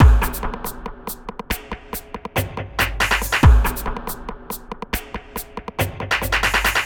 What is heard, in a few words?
hard beats 140, hard trance beats